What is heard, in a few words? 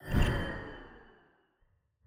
environment mob despawn